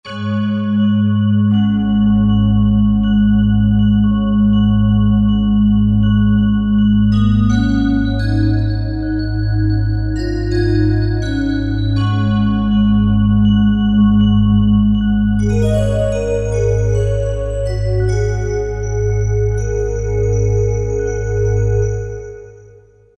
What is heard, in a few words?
Soft Rings, Korg MSC-05S